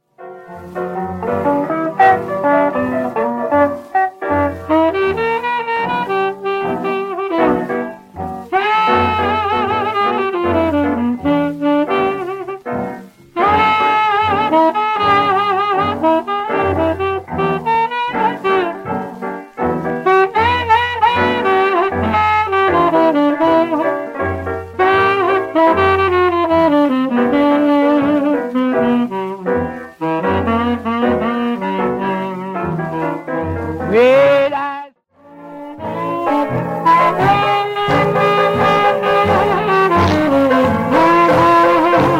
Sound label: Sax Solos